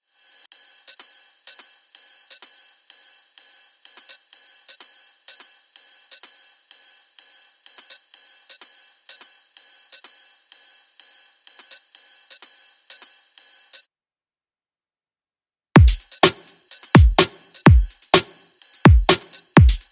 Beats Only Mix

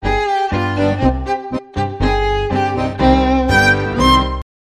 Tango In Paris, Sting

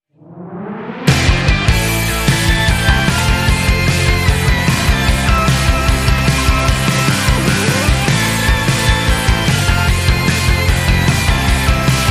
Guitar, Jingles

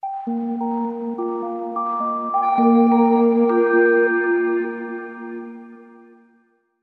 Hymns Sting

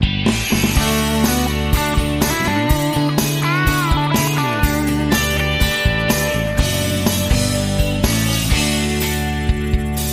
Guitar Central

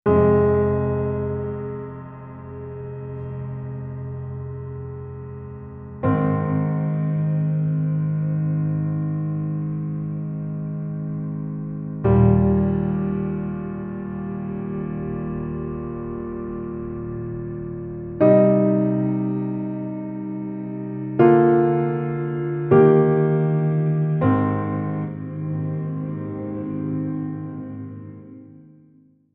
Mellow Piano Underscore

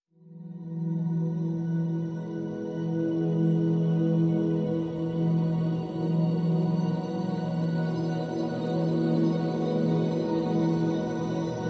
Pads Only

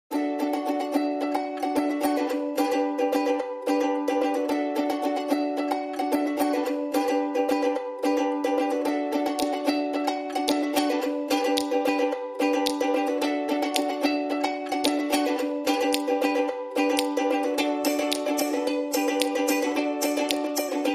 Drums and Ukulele Only

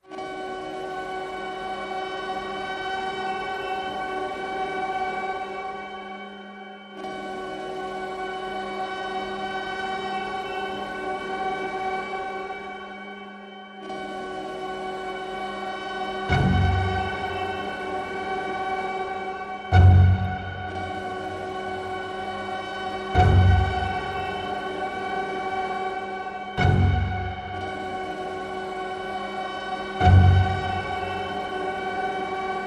Orchestra Only

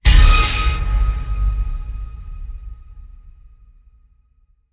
Afterlife Harsh Demon Scrape

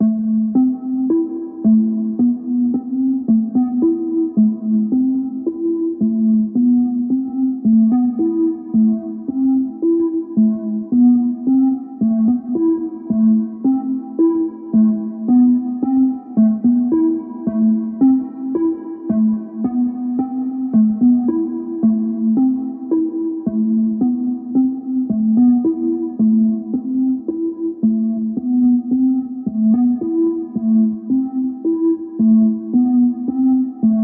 Chaotic, Sequence

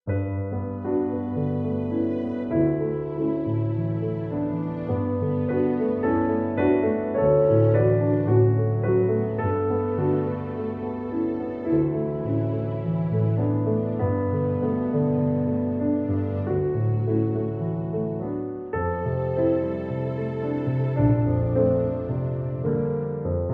With Strings

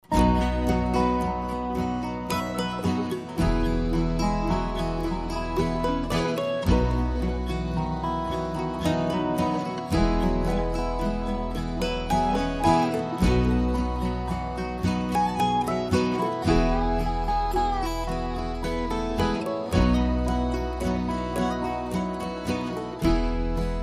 Mandolin Dobro Duet

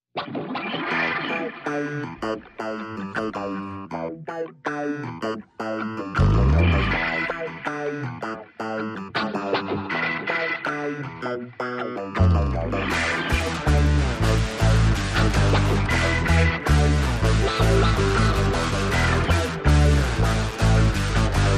Guitar Riff Only

